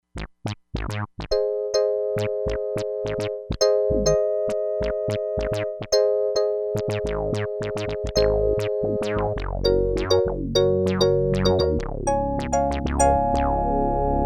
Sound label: FUNK TOUCH - SPILLO TRILLO, Crumar Bit 99; Factory Programs